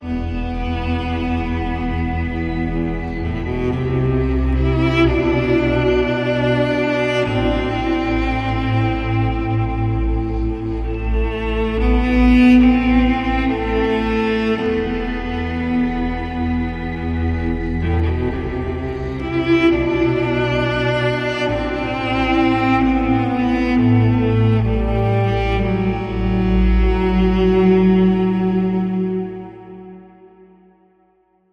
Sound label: Cello